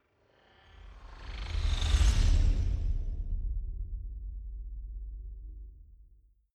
sci-fi vehicle train drive by hum low; raumschiff

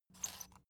salt mill squeak soft; berufe; gastronomie

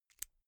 insert xlr extension latch hard; objekte; elektronik

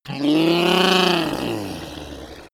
reptile talking pain; kreaturen

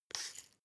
glass pepper grind smooth single; berufe; gastronomie; soundpool

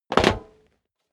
step leather shoes metal dry jump; schritte

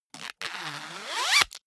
zipper pullover jacket close accelerate; objekte; reissverschluss

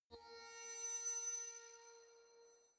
space mysterious chime ring; sweeps swells; comedy-cartoon; soundpool

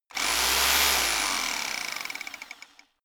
rock drill chisel burst short; maschinen; schrauben drehen hydraulik

